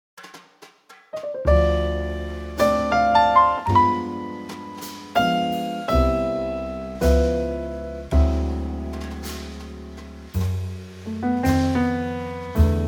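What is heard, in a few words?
Am Trio, backing track; key - Am - vocal range - E to C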